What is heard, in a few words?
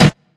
dry snare single hit e key